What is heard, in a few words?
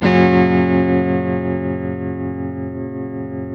TELE CHORDS MAJ G, Guitars; Spectrasonics; Hans Zimmer Guitars VOL-1